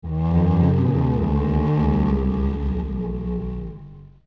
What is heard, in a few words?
Moan; ReDead; OOT